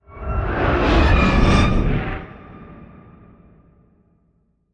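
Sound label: reverse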